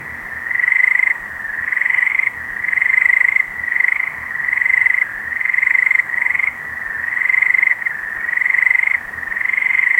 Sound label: a realistic croaking soun, croaking